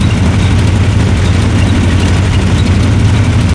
Tieng xe tang chay

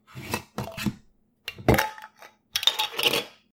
Tieng mo nap chai lo va sau do thao ra

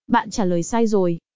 Am thanh ban tra loi sai roi

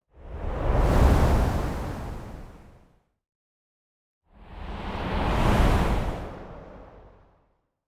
am thanh phu de tieng gio thoi qua manh

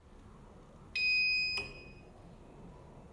tieng nhan nut thang may tieng bip

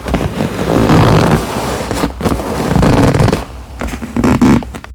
Tieng co xat manh voi ghe da do vat bang da

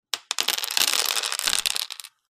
hat roi tren be mat go

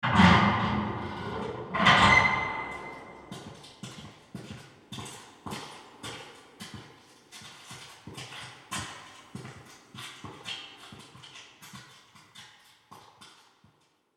Tieng mo cua sat nha xuong va tieng buoc chan di

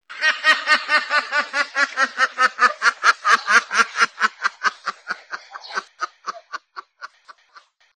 tieng vit keu lay loi hai huoc

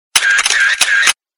tieng man trap may anh lien tuc

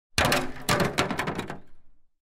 tieng tam nhua roi rot xuong san nha

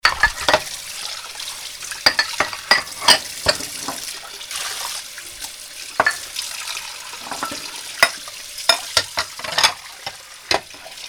Tieng rua chen bat

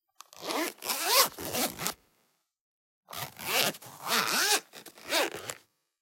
Tieng keo day keo keu

